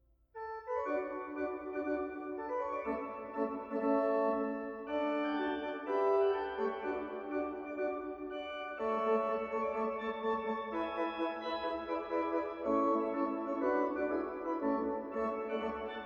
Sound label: music for mechanical clock